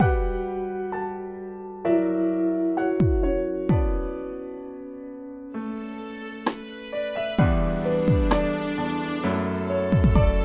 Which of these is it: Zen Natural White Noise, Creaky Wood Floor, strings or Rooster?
strings